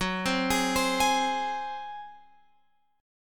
Gb Suspended 2nd Flat 5th Gb, down, Guitar, Open F; arpeggiated